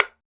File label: Bongo Hi Slp; Percussion